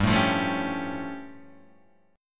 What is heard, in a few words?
A Augmented 7th G